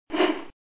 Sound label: spear throw; Mike Koenig